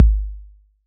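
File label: bass drum 808 Decay C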